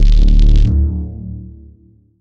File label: rad pulse